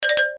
spawn music; slime rancher

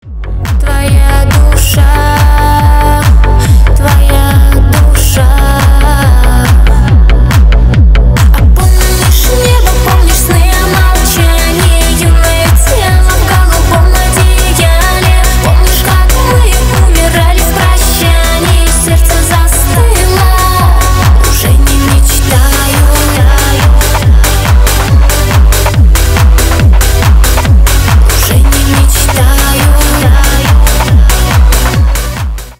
bass boosted tik tok remix

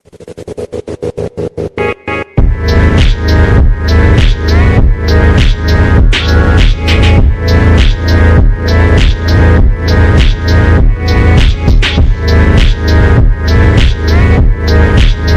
slowed reverb

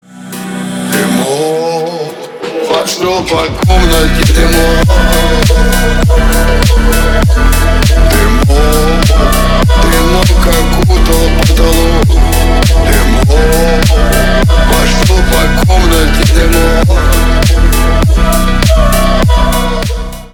phonk remix

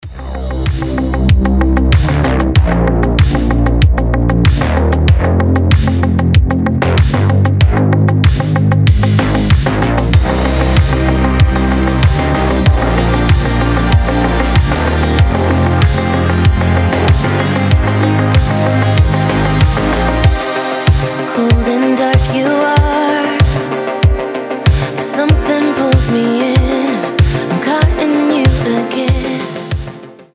cold retro

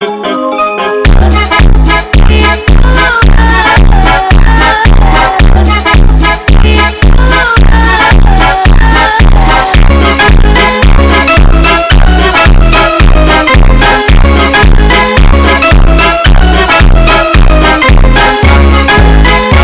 funk do bounce slowed

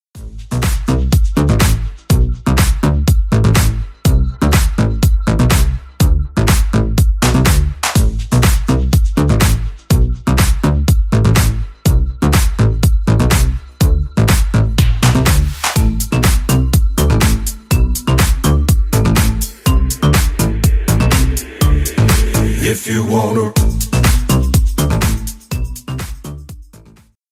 deep remix

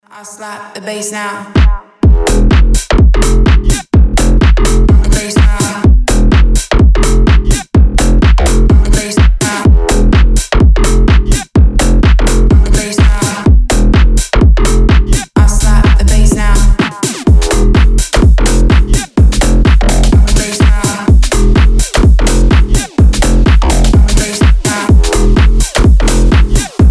slap the bass

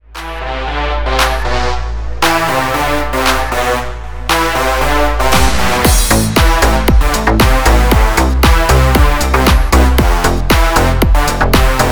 instrumental

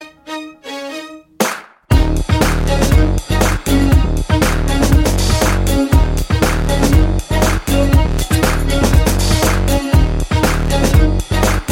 kalimba

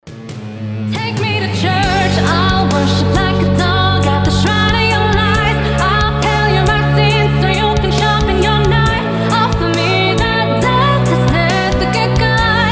female version

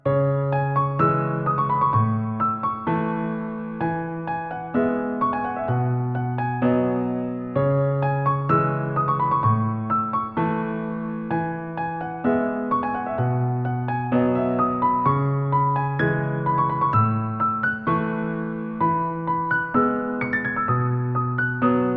piano cover

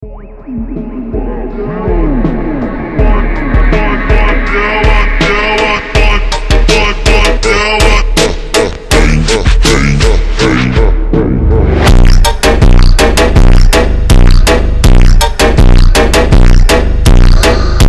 super slowed reverb

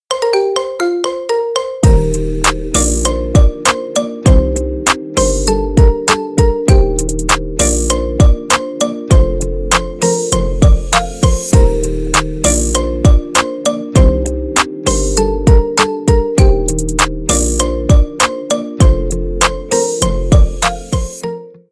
marimba remix

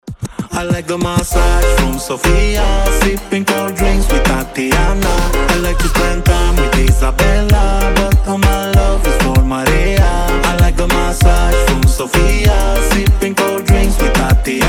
reggaeton remix

